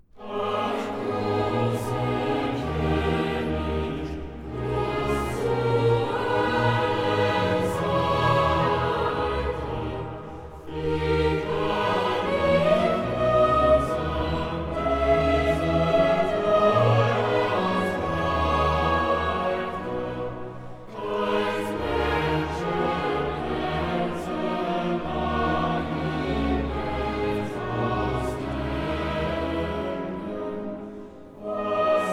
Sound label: Choral; Ach großer König; Klassika; Bach, Johann Sebastian; Choral Works; Johannes Passion BWV 245